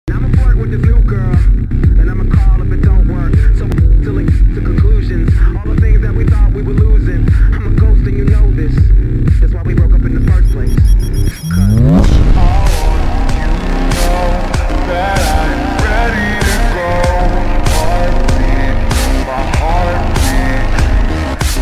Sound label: heartbeat slowed; slowed at perfect time